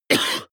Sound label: cough male single attenuated soft short; soundpool